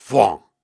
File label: Grunts and Chants, Viking Vocal